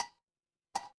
glass ceramic

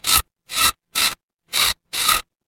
mechanical gear

